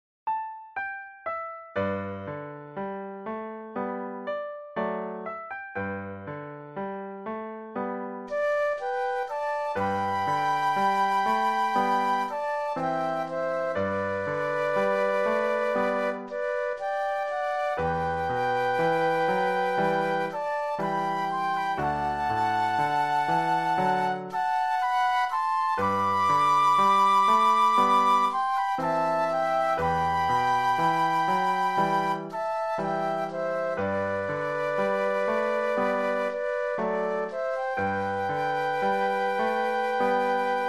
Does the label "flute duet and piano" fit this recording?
Yes